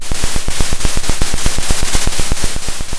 pop, Sound of a drum roll

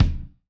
Kick, drum

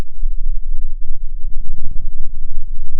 pop, Sound of a thunderstorm

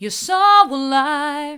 hard, vocal

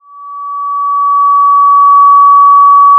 Sound of a police car siren

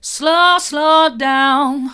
slow slow down hard, vocal

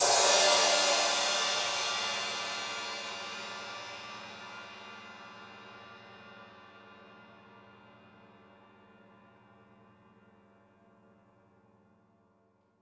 Multi Crash Hi, drum

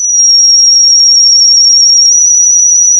pop, sound of a tea kettle whistling

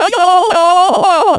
anger peak; VIVAE

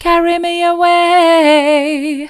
carry me away soft, vocal

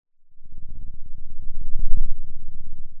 pop

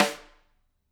room Rim, drum

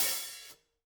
room Op Hat, drum

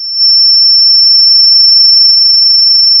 Sound of a tea kettle whistling

